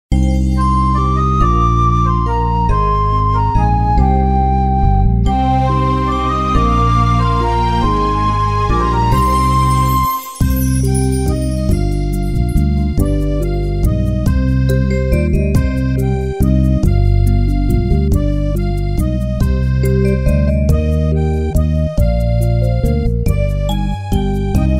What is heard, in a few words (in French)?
la photo de ma famille instrumental